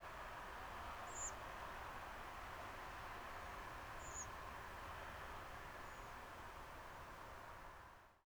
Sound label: freak shape, Redwing Turdus iliacus; Poole, Dorset, England, 22:08, 29 October 2016 (Paul Morton)